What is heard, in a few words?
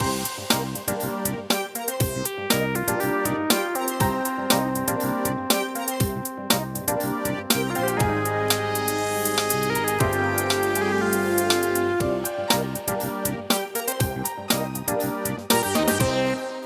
Retro, ringtones; Venus